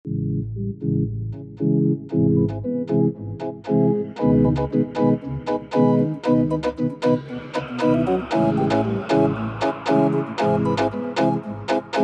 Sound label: Walk Funk slowed